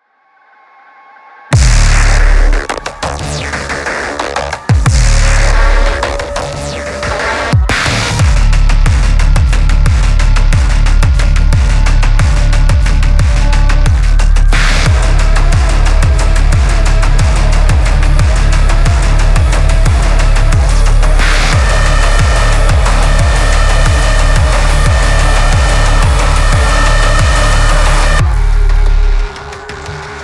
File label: Main Light; Blood Harvester - Main Light 12